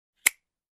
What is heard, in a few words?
Lighter Switch On